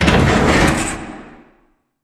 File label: pod door open slide; Titanfall 2